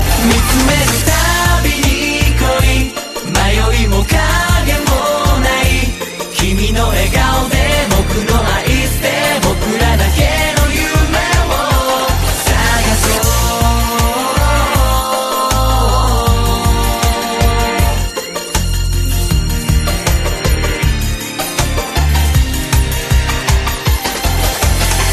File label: Is Calling Rock